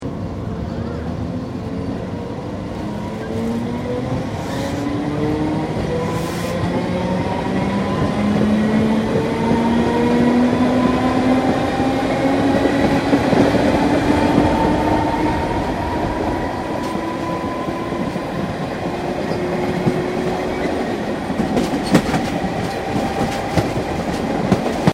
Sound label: Train Melbourne Interior Startup; Public Train in Melbourne; Trains; Vehicles